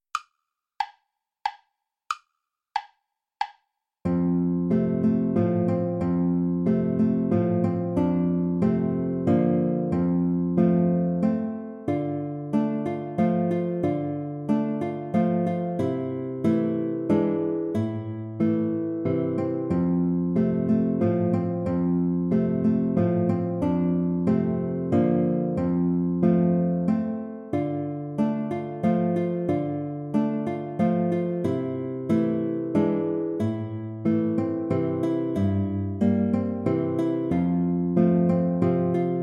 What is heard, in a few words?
waltz play along without guitar